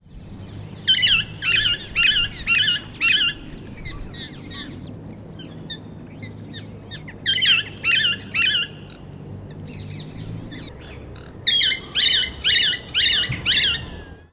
alexandersittich

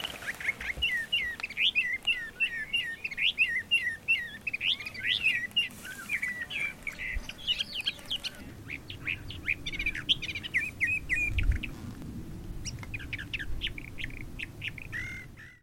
orpheusgrasmuecke

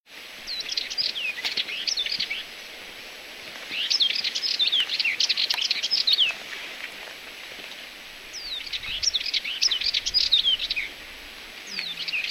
samtkopfgrasmuecke

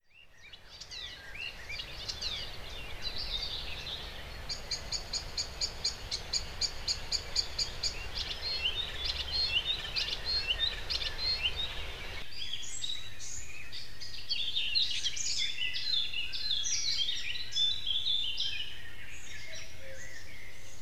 spreewald

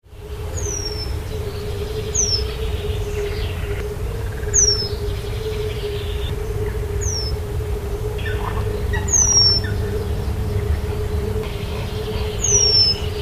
beutelmeise

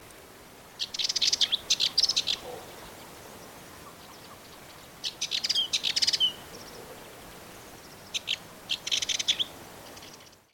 schuppengrasmuecke

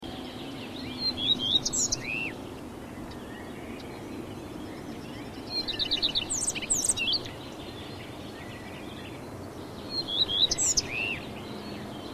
gartenrotschwanz

